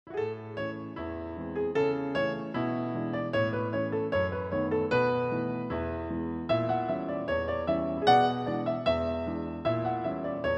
Norwegian Dances, Piano Duet; Opus 35 No.2

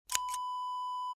scissors snip; Scissors Snip Wav Sound Effect

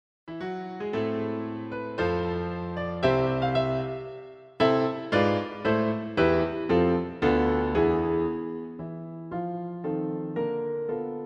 Piano Concerto; Opus 7; 1st Movement; Schumann Clara

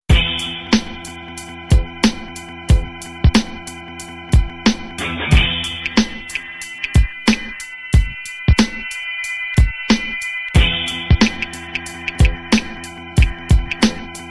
beat

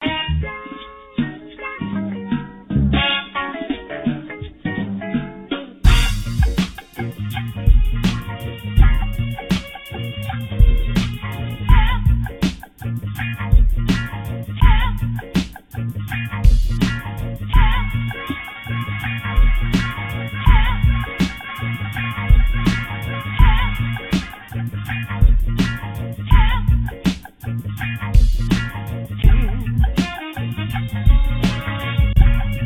beat

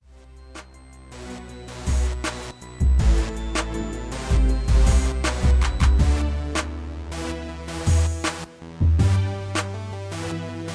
80BPM

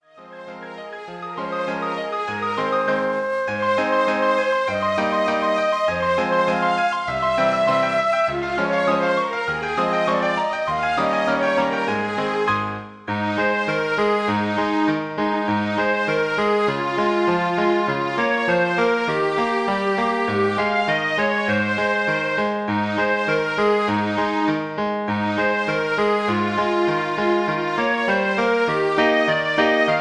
jingle bells original version; Jingle Bells Original 1857 Melody

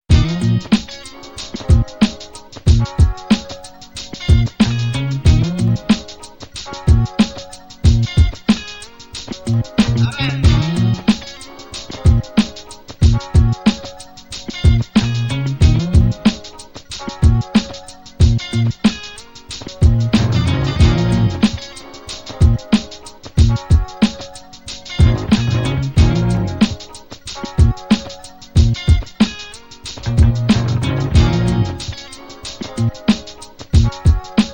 beat

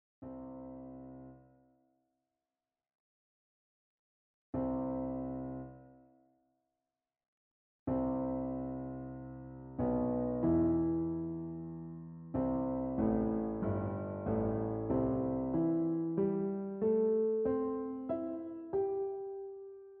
Les Preludes Piano Duet; After Lamartine Symphonic Poem; Liszt

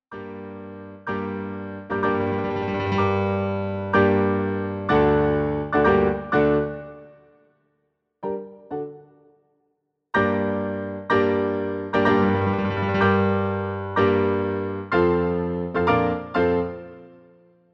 piano Duet; Overture to Norma; Bellini

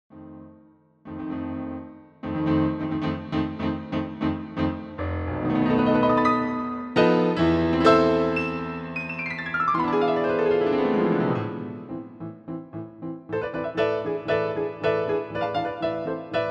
Piano Concerto; Opus 16 3rd Movement; Grieg

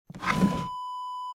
drawer dresser open; Dresser Drawer Open Wav Sound Effect

